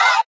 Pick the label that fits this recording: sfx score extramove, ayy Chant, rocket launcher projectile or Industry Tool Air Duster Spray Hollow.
ayy Chant